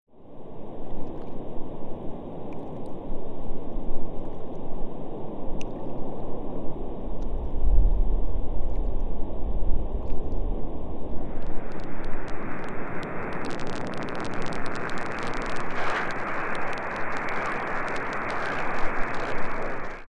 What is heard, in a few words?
tuning fork scene